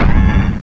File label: stomp; endboss